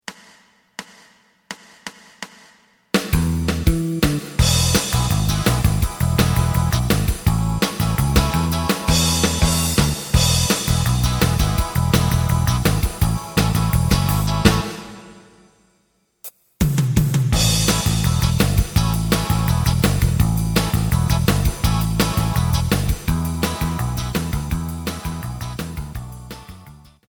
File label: lead